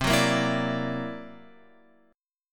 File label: C 9th Flat 5th C; C9b5 Chord {8 9 8 9 X 10} strummed